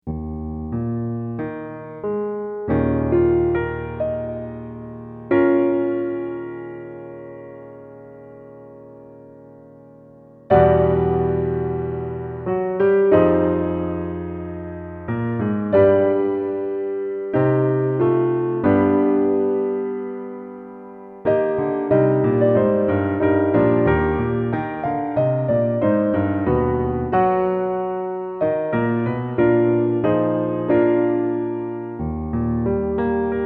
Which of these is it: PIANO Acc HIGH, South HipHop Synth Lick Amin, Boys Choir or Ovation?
PIANO Acc HIGH